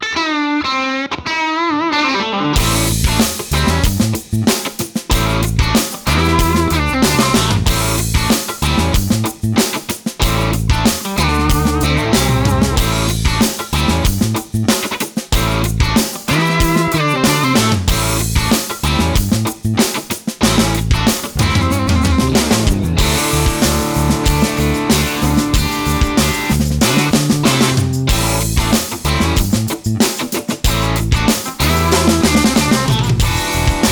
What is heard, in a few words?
Funky 70s